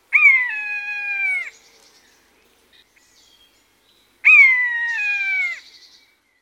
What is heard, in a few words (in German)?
Maeusebussard Buteo buteo